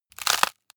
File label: bone break large